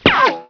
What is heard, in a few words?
ric, weapons; quakemapping